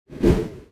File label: woosh